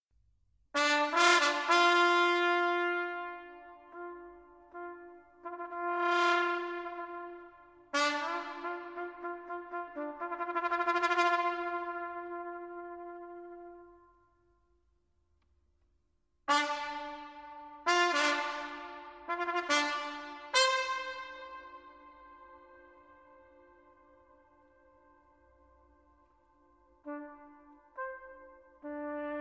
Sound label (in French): Sequenza trompette; Berio